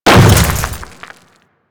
Hammer Boss Step Dirt, footsteps; Warframe; Grineer

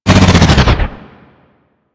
Hijack ATV Stop; Warframe; Corpus Ice Planet

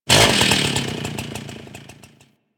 Saw Rev Ambient; Attacks; Warframe; Grineer Ghouls

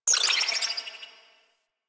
Tech Data Beep; Warframe; Ambience; Large Ramp Hall

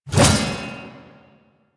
Shield Whoosh; Warframe; Duviri; Duviri Dax; Weapons; Duviri Mace And Shield

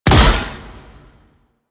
Burst Explosion, Weapons; Warframe; Tenno; Miter

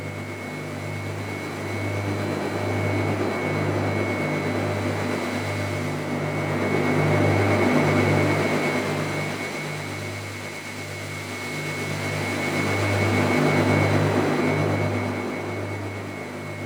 Rail Jack Machine Room Ambience; Warframe

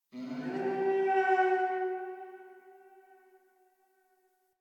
Tree Distant Critters Angry, Ambience; Warframe; Duviri

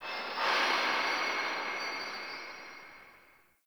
Under City Alley Distant Mechanical

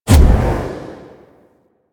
Void Projection Enter; Powers; Warframe; Focus; Operators; Wraith

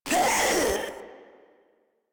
Proto Infested Runner Pain; Warframe

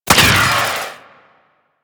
Proc Corrosive; Mods

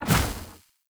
Casting Device Impact Default; Weapon Impacts; Player; Casting Devices; Ode Casting Device

